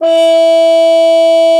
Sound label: CUP MUTE; Xsample VOL-3